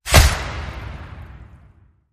Fear Face Slap Hit

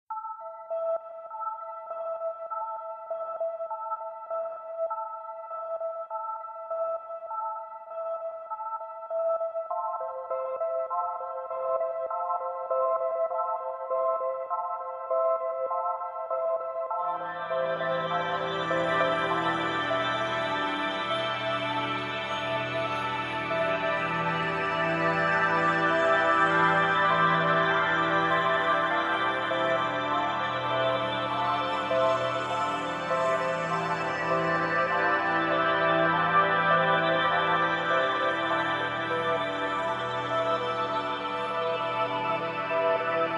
Synths

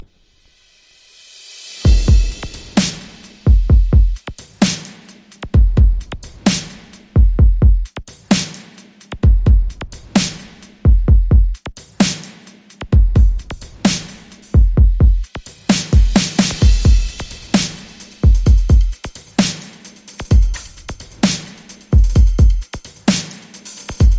Drums SFX

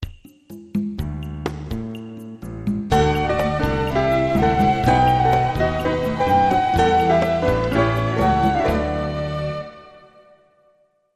Sinuous Swirl Sting

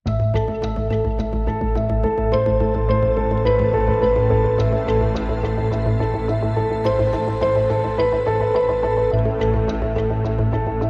Piano Pads and Pulses Only

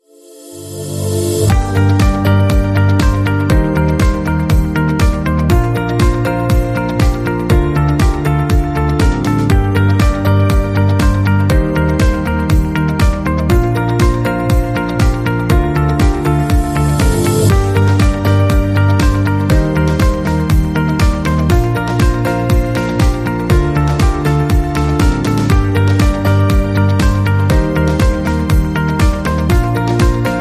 Corporate Inspiring

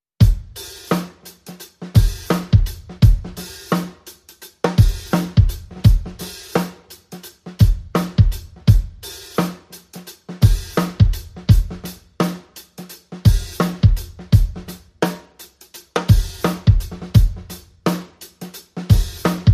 Drums Only

